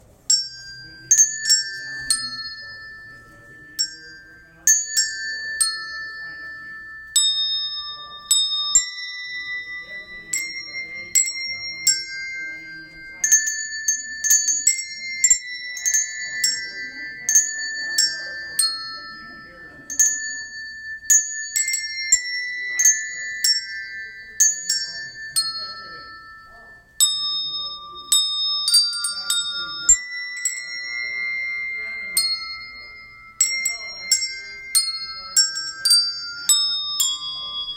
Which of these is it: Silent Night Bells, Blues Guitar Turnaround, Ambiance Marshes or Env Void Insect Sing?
Silent Night Bells